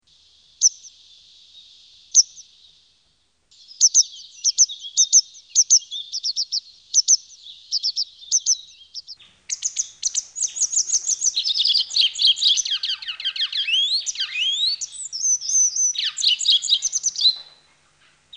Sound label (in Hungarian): Hegyi billegetх; Madarak